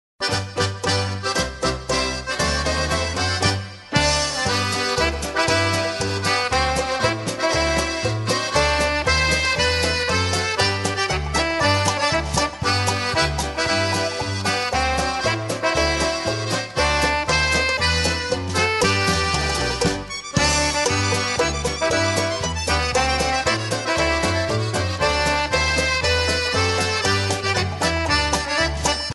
Medley

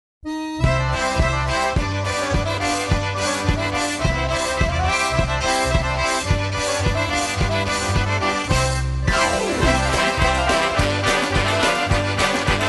Polka

